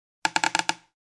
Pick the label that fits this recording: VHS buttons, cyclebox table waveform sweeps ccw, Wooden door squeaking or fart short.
Wooden door squeaking